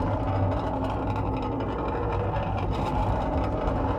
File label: minecart rolling loop